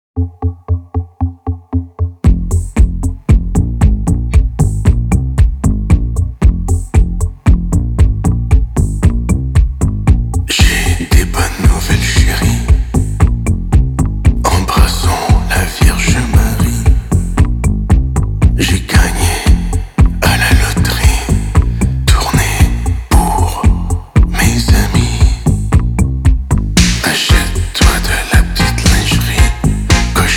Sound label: disco polizei